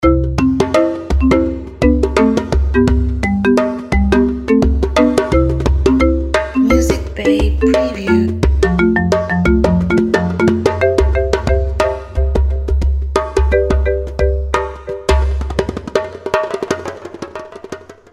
Marimba

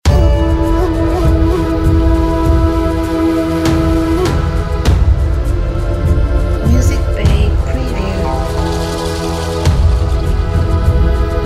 Egyptian Ceremony

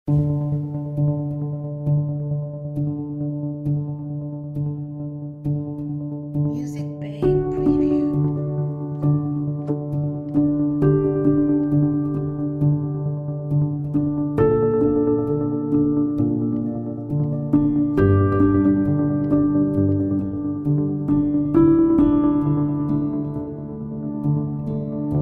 Dreamy Piano Nostalgia

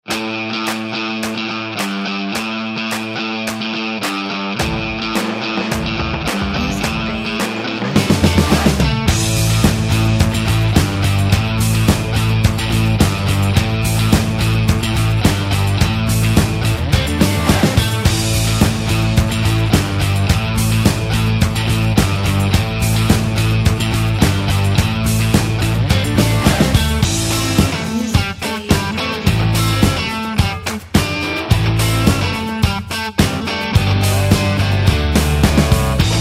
Uplifting Energetic Rock

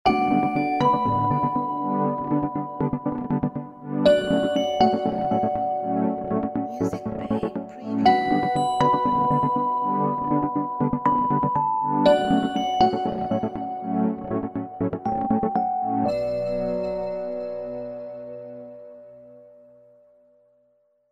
Technology Logo

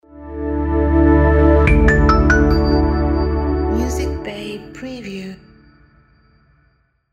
Pluck Logo

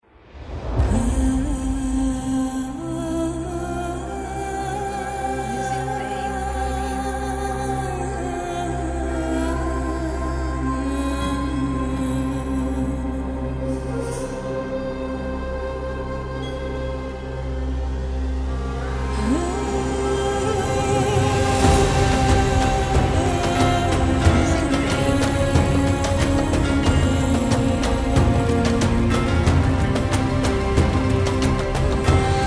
Arabic Cinematic

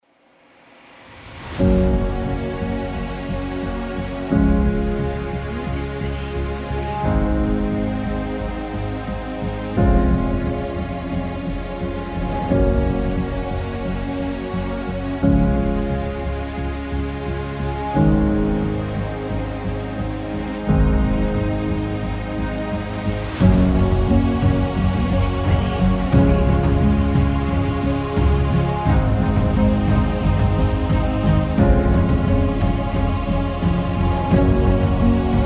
Epic Inspiring Cinematic Trailer

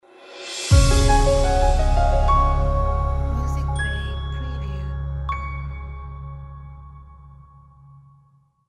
Elegant Piano Logo

